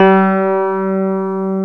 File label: C5, FM PIANOS